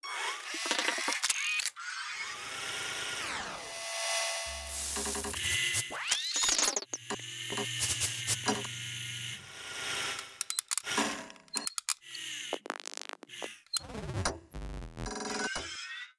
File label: robot head loop; teardown; ps5 windows xbox series xs